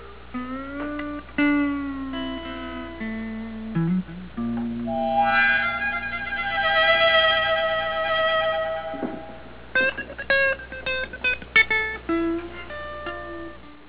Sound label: jail music; bradybunch